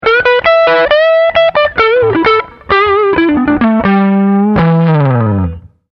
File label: Solo, gitarre